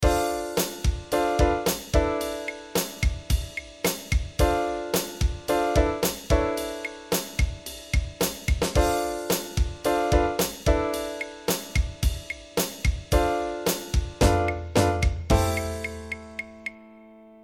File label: weird thing with piano